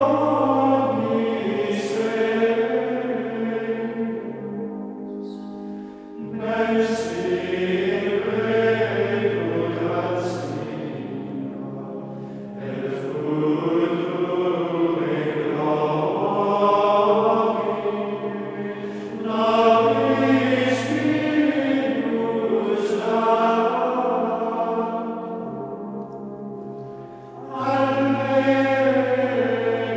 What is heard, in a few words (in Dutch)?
Koor (mannen) Gregoriaans; O sacrum convivium